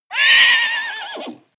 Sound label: horse neigh loud